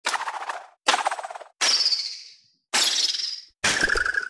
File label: Slingshotted Bullet Casing